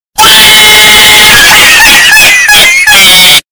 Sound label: cat laugh loud